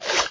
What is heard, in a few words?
Box swipe